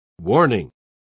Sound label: warning